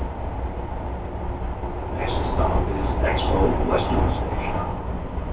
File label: western